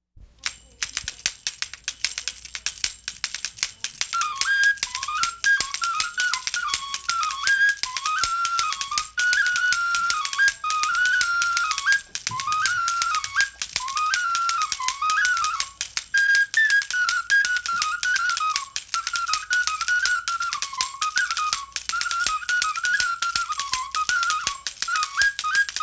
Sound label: Tip Tap for Dancing - Flute and Triccaballacca; calabria